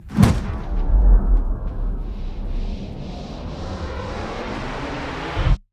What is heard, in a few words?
slowdown left; Pitch Black - A Detective's Demise